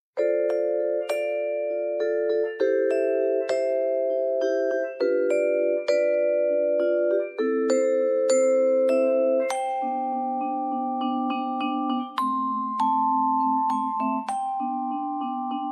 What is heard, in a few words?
Vibraphone